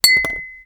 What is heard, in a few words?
pling